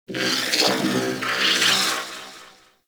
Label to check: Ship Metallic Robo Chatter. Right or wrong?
Right